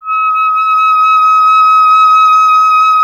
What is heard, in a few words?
clarinet D#, Solo Instruments